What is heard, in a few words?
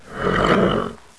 Bull